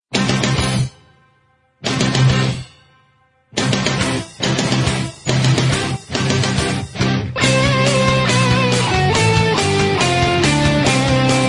marcha nupcial rock

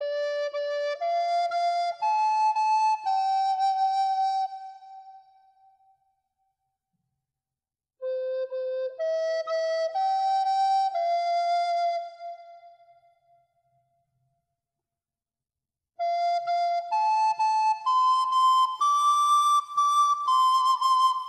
flauta meme

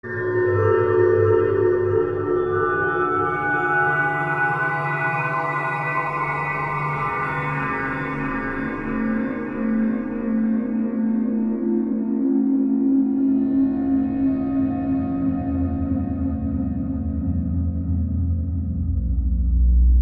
violin terror

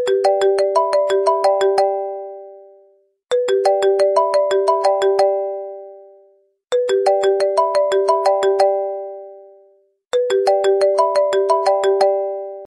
iphone originales

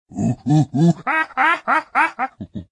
sonido mono veloz; rumble stars

